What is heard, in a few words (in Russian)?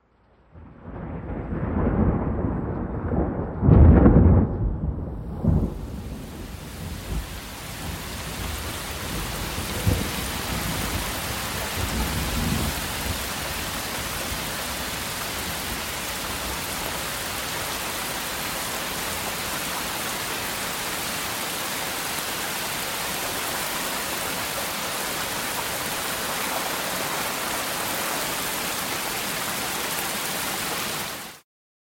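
удар и начало дождя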